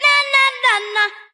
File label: na na na na sung